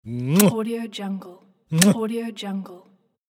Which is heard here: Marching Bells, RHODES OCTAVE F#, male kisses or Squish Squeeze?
male kisses